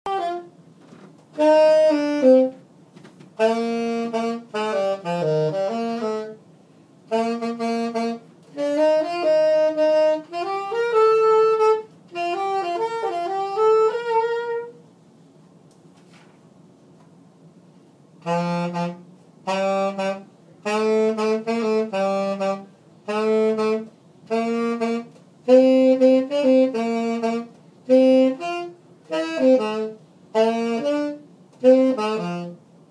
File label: practicing tenor sax